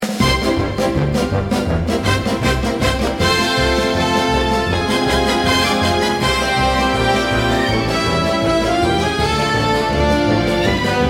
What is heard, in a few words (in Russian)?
канкан, piano